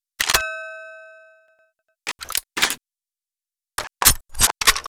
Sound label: heavy laser reload